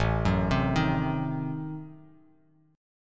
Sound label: A Diminished 7th A down Bass Standard; Adim7 arpeggiated; JGuitar